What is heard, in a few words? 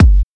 KICK, DRUM HITS; Dubstep; Loopmasters; The New Sound Of Dubstep